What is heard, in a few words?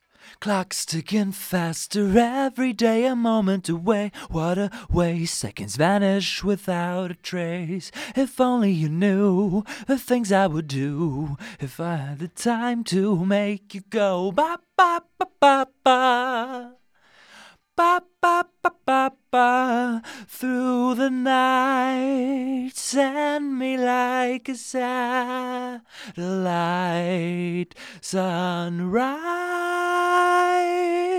vox; logic